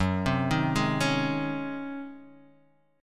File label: C sharp Major 7th Suspended 2nd Suspended 4th F sharp